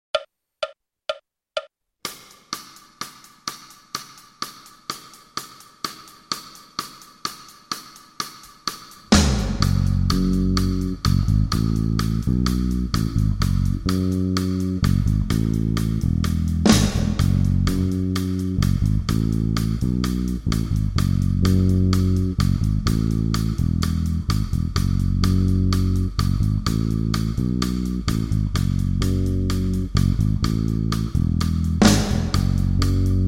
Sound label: pop rock